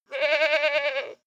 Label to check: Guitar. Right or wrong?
Wrong